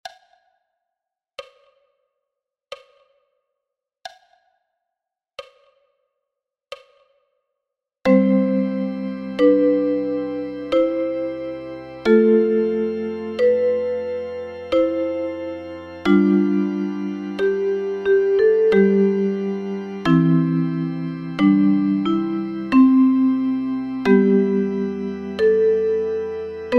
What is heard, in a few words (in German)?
Choral Ukulele und Bariotn Sound kompl; Bach